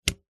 household electrical plug phone charger pull remove from wall socket